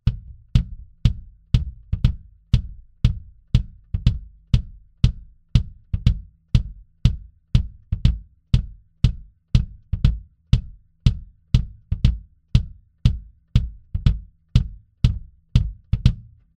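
sE Electronics V Kick Resonanzfell Classic Classic, kick drum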